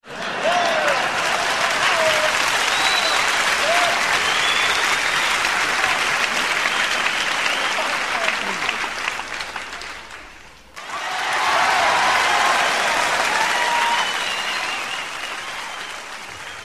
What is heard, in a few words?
Applause